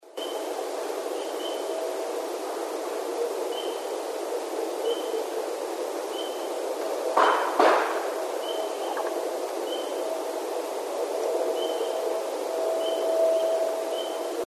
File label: wood knock, bigfoot